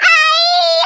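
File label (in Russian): крик детский милый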